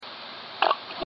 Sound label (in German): Radio Rauschen